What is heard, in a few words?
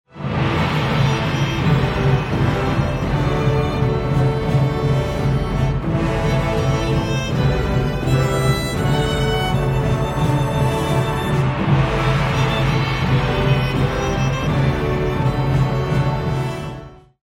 Fanfare, The Charge; Rohan; Lord of the Rings : The Return of the King